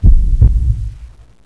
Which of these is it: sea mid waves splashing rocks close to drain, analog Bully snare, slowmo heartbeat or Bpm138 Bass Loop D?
slowmo heartbeat